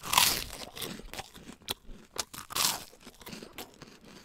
crunchy double, eating and drinking; gmod

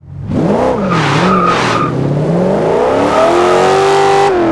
first, vehicles; dod challenger15

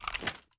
inspect, reloads; gmod

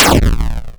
eplode, pong; gmod; paris

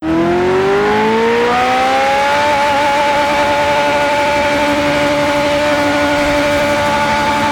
rev, ferrari f40; vehicles

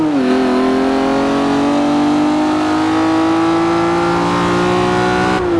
third, vehicles; bmwm3gtr

